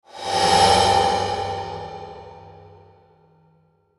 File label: Metallic Sweeper Swell Bright; Metallic Sound; Blastwave